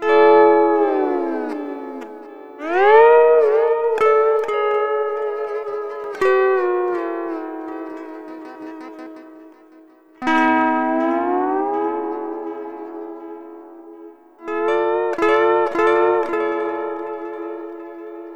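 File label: Sunrise Guitar Chord G#, Lounge; Zero-G Kocktail Kollection; Construction Kitz (Lounge); Sunrise Mixes 082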